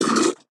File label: recycler vox; Factorio